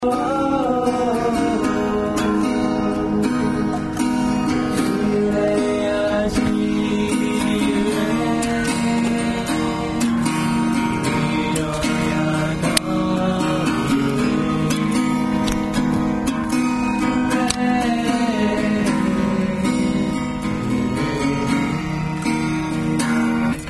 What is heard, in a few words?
singing